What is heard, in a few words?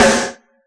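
rock kit snare; Giga Samples Collection; Dr 202 Kit 1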